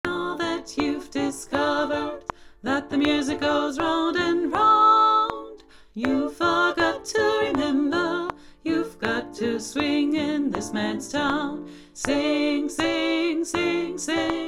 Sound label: bass